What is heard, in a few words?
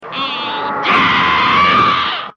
witch die